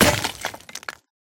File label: break; Immortal 1.20.1 vanilla; minecraft